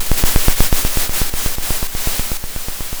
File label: pop, Sound of a drum roll